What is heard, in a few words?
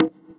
Conga; Equipped Music; Loungin House